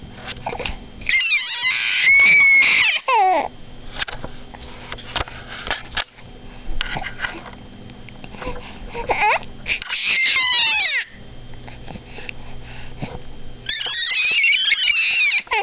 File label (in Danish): pige skrig